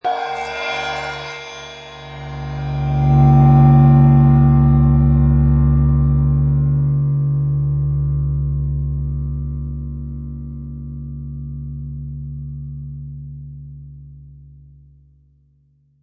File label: Cartoon Drum Cymbal Weird; Comedy/Cartoon; Resonating, like vibrating after being hit; Funny Sounds; Lynne Music